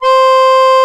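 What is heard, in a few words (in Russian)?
аккордеон до4 C4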